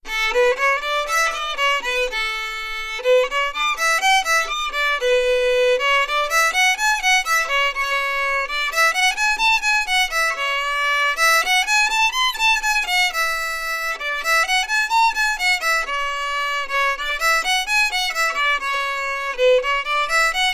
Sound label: Beginner Violin Practice Scales